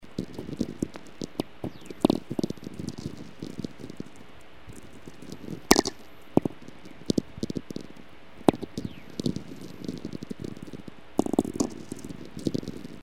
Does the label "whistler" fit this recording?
Yes